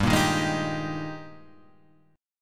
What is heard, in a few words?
G sharp Major 7th Suspended 2nd